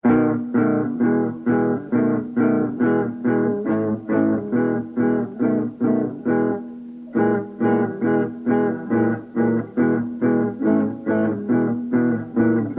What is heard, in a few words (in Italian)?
intervallo